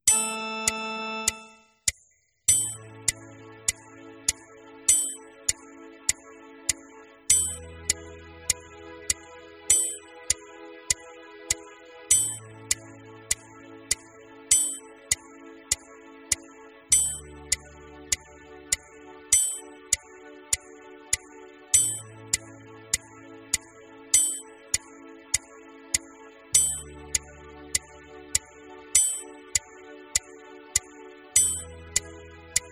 Warm Up A, warm-up exercises; Playalongs zu den WARM-UP EXERCISES; otmars warm up exercises

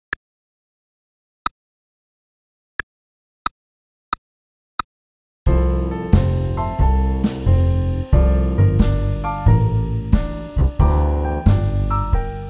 Trumpet Playalong; trumpet fun vol 1

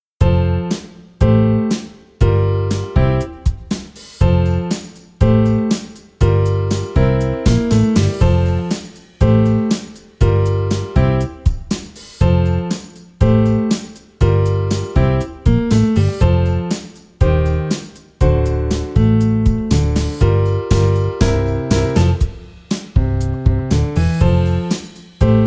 Play Along, trompete; Playalong-straight; easy beat vol 1 trompete

